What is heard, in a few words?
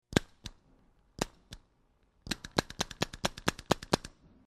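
misfire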